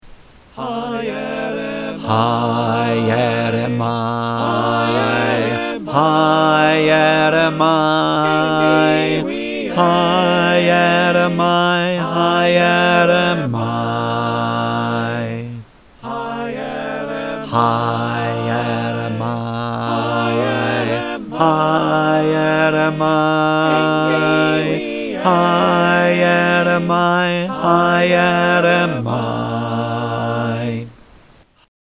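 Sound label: Bass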